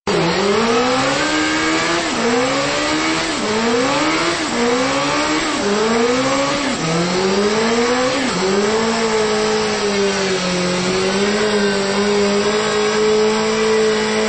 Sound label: Biker burnout